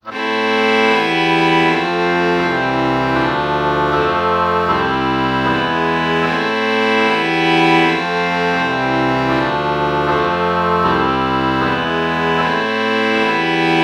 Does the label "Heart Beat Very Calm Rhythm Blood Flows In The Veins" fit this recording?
No